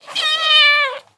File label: Kitten Meow; SES Animals SFX